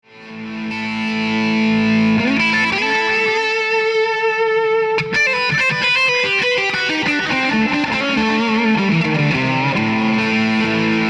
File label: Opto Line6 ODR1